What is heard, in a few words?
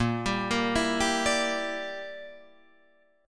Bb 6th Flat 5th Bb, Guitar Drop D